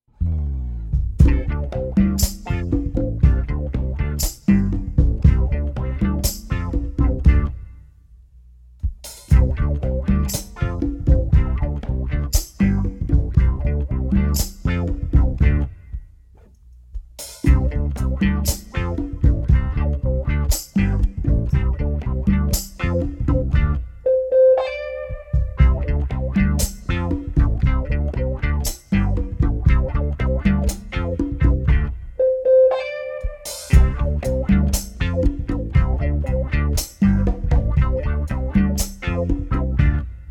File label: instrumental